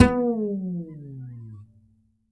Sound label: Upright Acoustic Bass